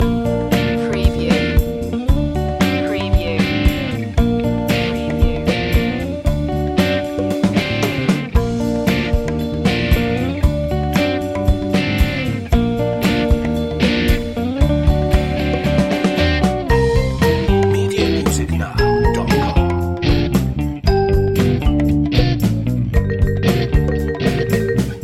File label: childrens soft rock loop